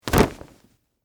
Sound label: Short Sleeve Unsnap, Foley, Clothes, Short, Sleeve, Shirt, Unsnap, All, Buttons, At, Once; Dressing; BlastWave